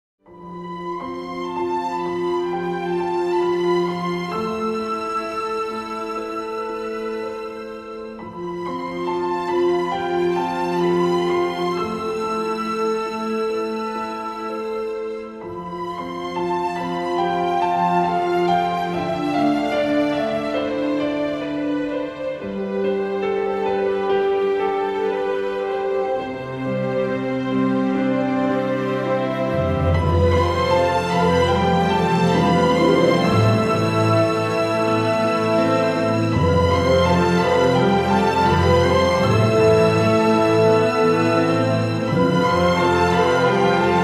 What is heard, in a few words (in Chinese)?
深沉的背景音乐; 3分以内